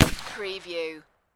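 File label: Pistol Ruger 357 Single Shot Exterior At 45ft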